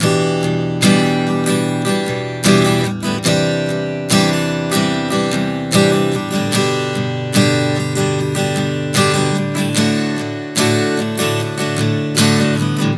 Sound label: Progression 074 Em-Cadd9-G-D, Acoustic Guitar, Chord Progressions 074 bpm; Sonic Foundry; Songwriter Acoustic Guitar Companion